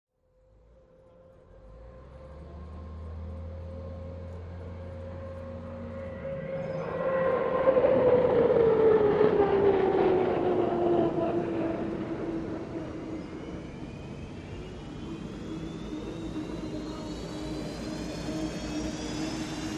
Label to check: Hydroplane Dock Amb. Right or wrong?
Right